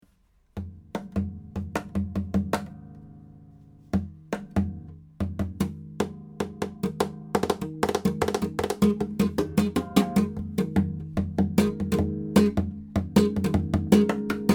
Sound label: Drums; Ramirez 1970F